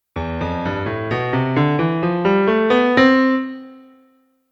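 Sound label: Bass Clef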